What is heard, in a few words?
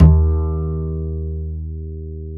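double bass D#2, Jazzistic; Univers Sons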